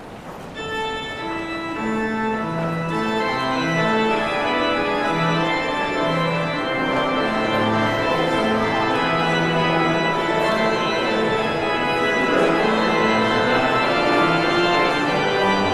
Postlude

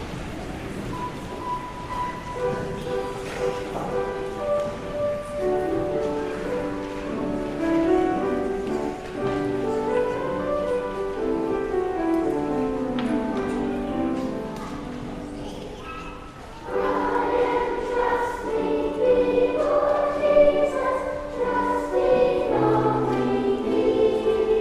Choral

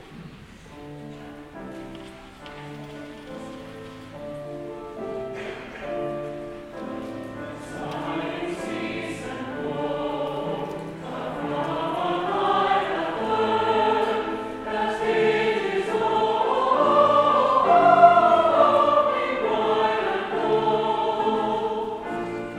Choral

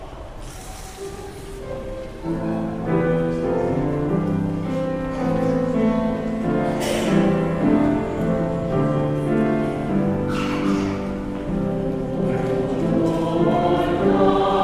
Choral

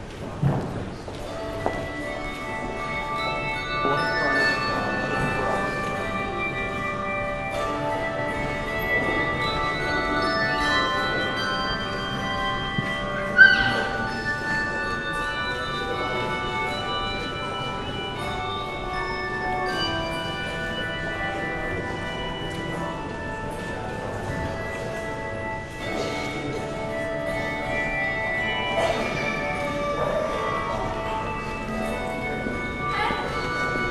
Handbells; My Song Is Love Unknown

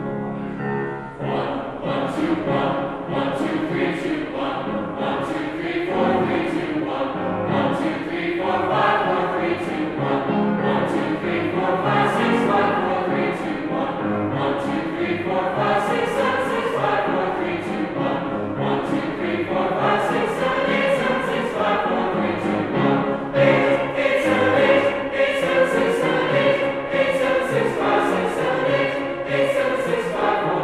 Choral Warmup

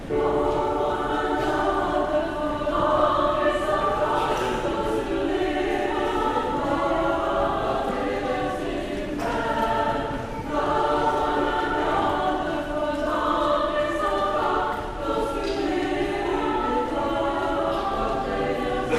Choral

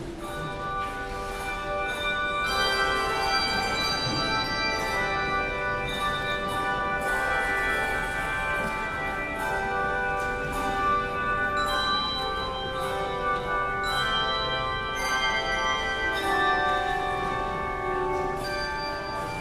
Handbells; I Know That My Redeemer Lives

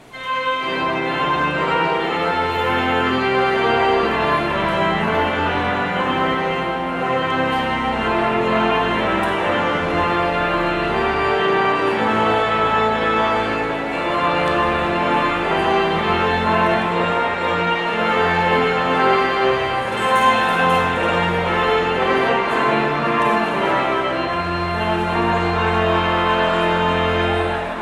Postlude

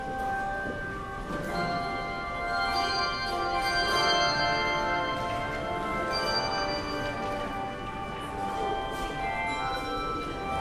Handbells; Lasst Uns Erfreuen; Behnke

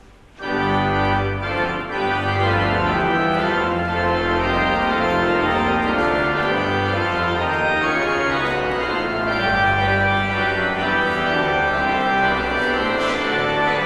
Postlude

